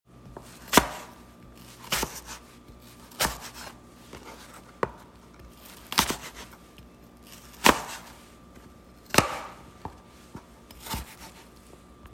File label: Cutting Onions